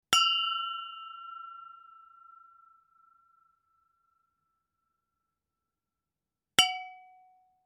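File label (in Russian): звуки чоканья на празднике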